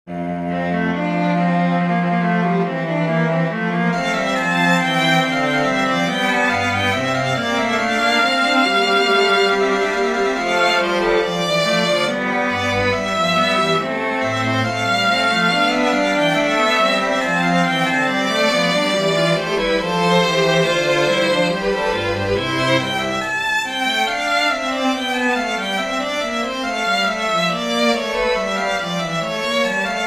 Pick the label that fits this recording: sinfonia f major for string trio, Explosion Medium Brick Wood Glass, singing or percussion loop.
sinfonia f major for string trio